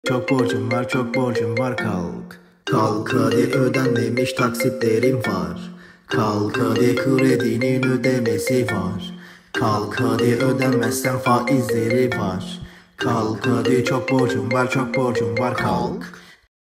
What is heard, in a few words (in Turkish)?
alarm sesi; cok borcun var kalk